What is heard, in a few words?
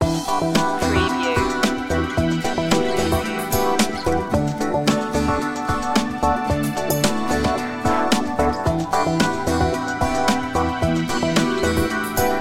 acoustica